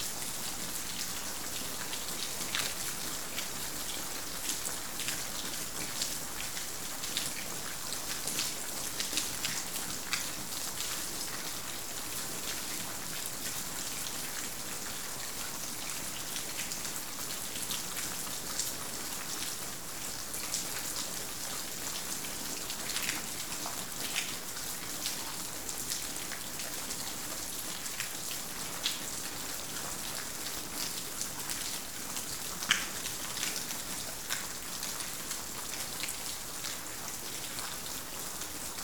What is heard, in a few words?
Rain subsides at cave entrance; boom library; Thunder And Rain